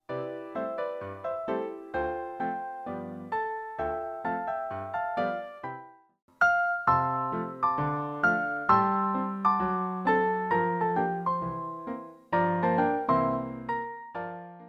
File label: swing band